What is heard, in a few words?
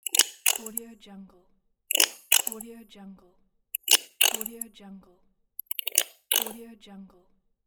scissor cut